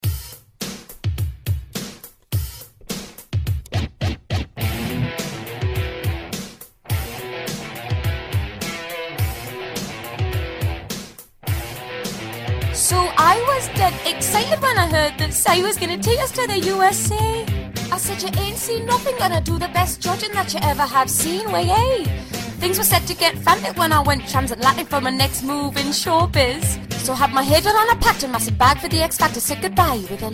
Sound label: accent song